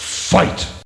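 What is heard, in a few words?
Fight Game Ringtone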